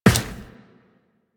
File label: Run Default, Footsteps; Warframe; Tenno